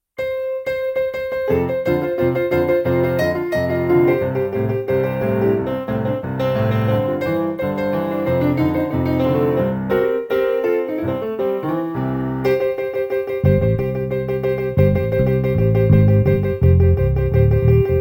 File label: pc3 rock